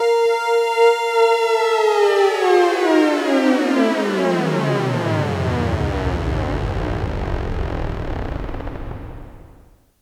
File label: Pitch Bend, Melodic Synth; Drum & Bass